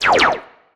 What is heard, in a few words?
Sci-fi Laser Gun Blast